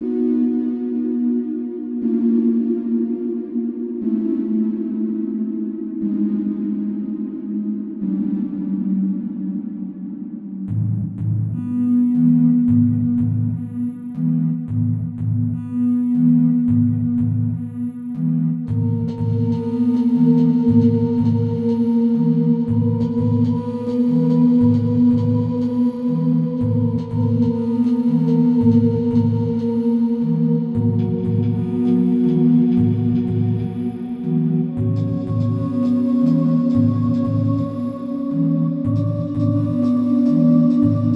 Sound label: Sine Wave